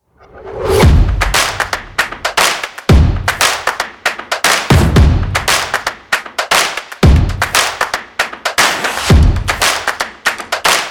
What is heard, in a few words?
Upbeat Stomp Clap and Percussion No Shouts